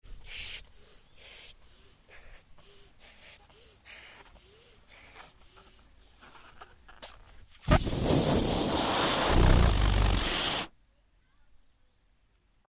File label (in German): luftballon aufblasen und luft rauslassen